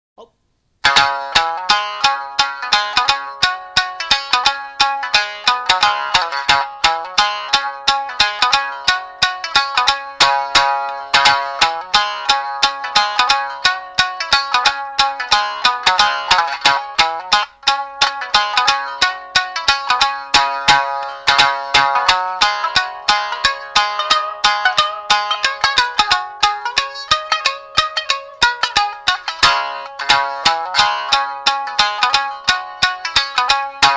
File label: Niagari choshi Shamisen